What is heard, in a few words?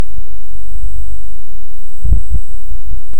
Hydrophone; OneOcean